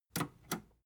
metal button robust click slow

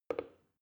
plastic button start click soft fast

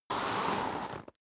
step snow slide long

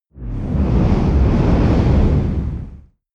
whoosh dark storm heavy

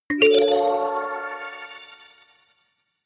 notification kalimba magical fifth up

